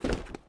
drop rubber; Rust

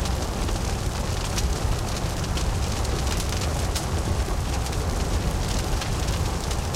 fire; War Thunder

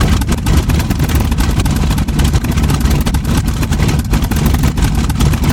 Aircrafts Engines DB605; DCS

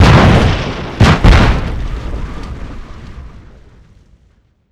f15 missile exp close; Modern Warfare

